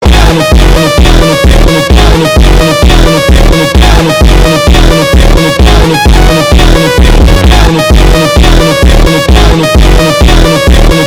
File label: spooky phonk